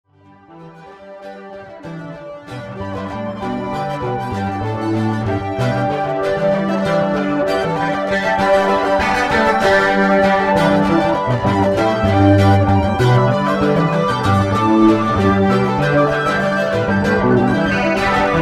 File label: Loop